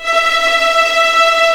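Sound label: E5 tremolo, String; East West; The Ultimate String Collection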